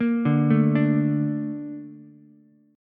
A sharp Major 7th, Ukulele; arpeggiated; JGuitar

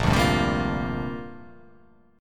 A sharp 6th Add 9th

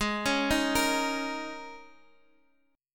Gsharp Suspended 2nd Suspended 4th

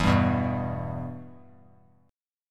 E-Minor; strummed; JGuitar

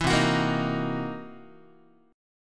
C 7th Sharp 9th Flat 5th, C, down, Guitar; strummed; 4A,4E,5A,5D,5G#,6C#; chord; JGuitar

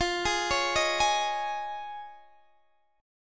F Minor 7th Sharp 5th Guitar Open D, chord; down; chord shape

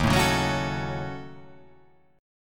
Eb Minor 7th Flat 5th, down; strummed; chord; JGuitar

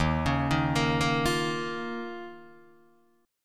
Eb 7th Suspended 2nd, Guitar, Open D

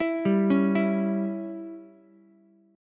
Db Diminished Db down Ukulele; arpeggiated; JGuitar

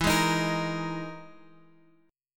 E Major 7th Flat 5th Guitar Open F; EM7b5 strummed

